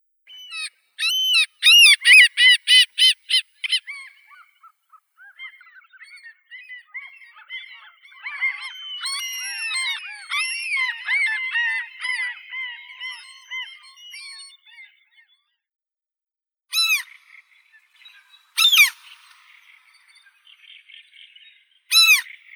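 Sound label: common gull, larus canus; chaika sizaya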